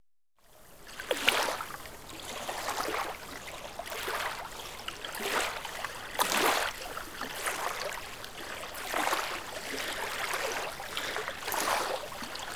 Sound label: Small ocean waves crashing; Nature Sounds: Ocean